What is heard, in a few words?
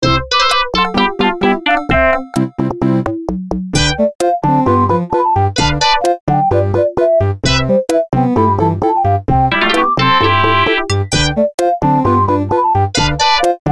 ring cha cha